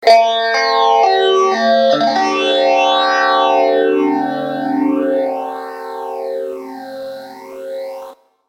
Phaser

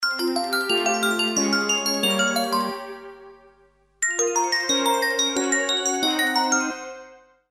chimes playin, sms; ringtones

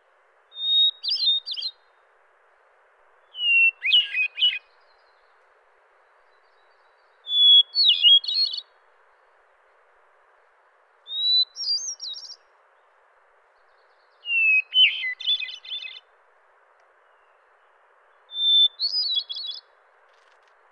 Hermit Thrush

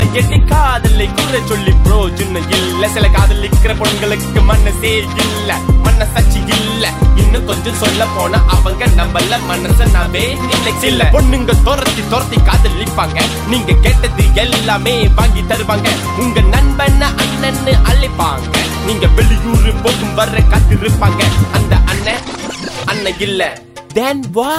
rap

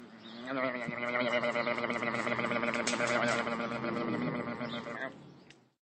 Goat eating doritos

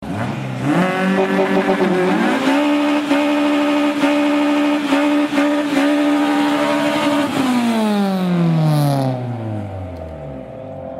type r burn

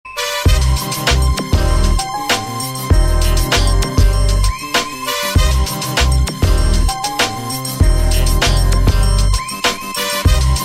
instrumental

